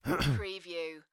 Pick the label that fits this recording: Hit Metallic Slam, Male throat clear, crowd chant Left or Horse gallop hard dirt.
Male throat clear